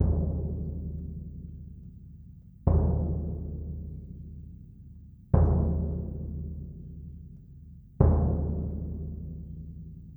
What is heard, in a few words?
Bass Drum, Orchestral; Sonic Foundry (Sony Creative Software); Orchestral VOL-2; Modern 25